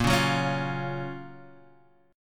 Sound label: F sharp Major 7th